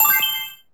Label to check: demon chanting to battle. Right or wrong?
Wrong